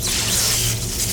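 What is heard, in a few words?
mship trapwall attack; monster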